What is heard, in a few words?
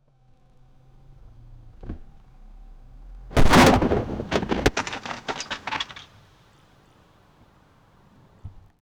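car exploding and crashing; accidents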